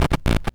vinyl

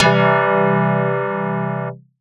Analog Organ Chord; Big Fish Audio; OSH Chords

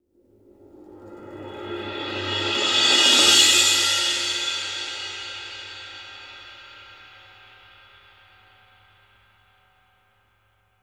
RIDE SUS A, RIDES; Sampleheads; New York City Drumworks VOL-1; SP RIDES

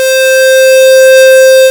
SQUARE WAVE

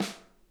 SNARE, Interactive Drum Kits; Sonic Reality

